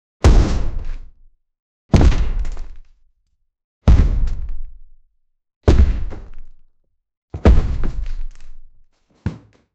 very heavy overweight per, creaking

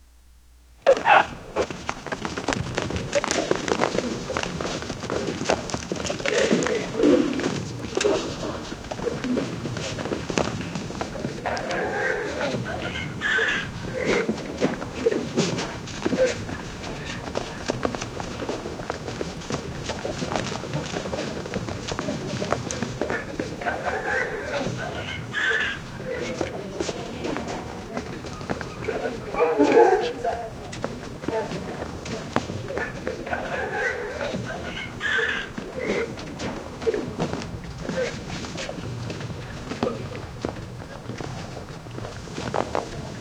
crowd chatter animal grow; animal sounds

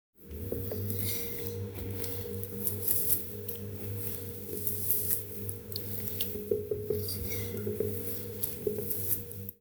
ambient

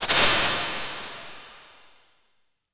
a short burst of digital, glitch

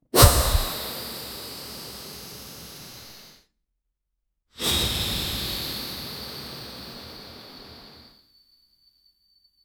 soft blow on the balloon

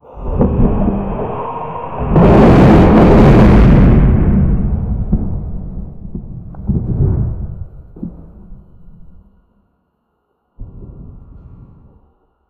a thunder sound effect, birds singing

